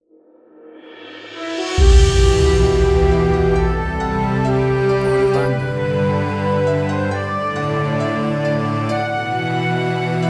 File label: Action Adventure Bravery Music